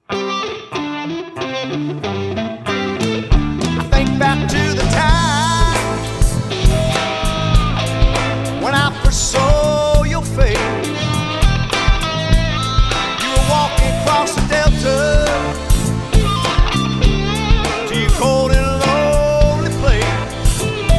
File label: Southern Rock Band